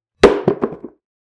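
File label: tipping over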